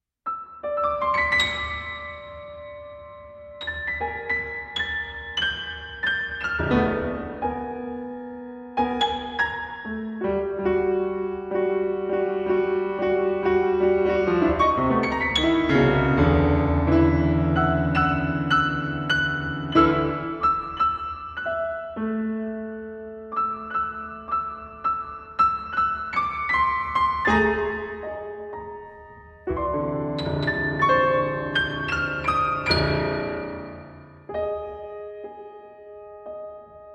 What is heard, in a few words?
piano sonata; Armenian Music Project; Armenian music new and rediscovered